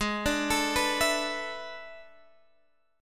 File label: A Major 7th Suspended 2nd Suspended 4th